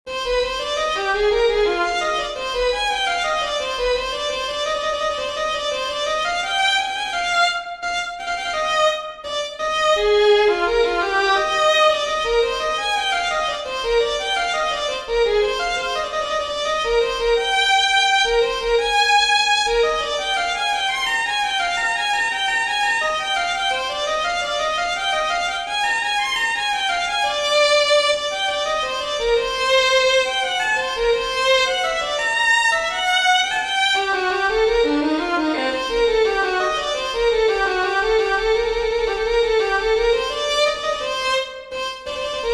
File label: invention c minor for violin viola; bach johann sebastian